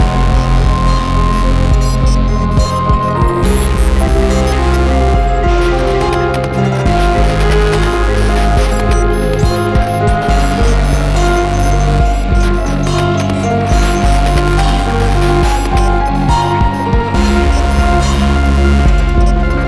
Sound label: Glitch sonata Medium; rive wreck hack die retry; ps4